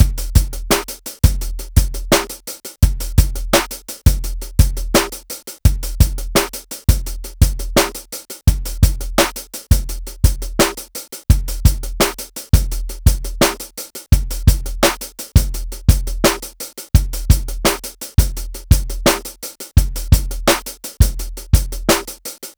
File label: Drum Kit, Electro Lounge; Sonic Foundry (Sony Creative Software)